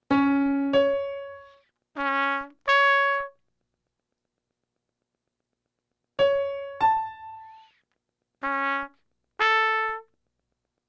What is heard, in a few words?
ear training example intervals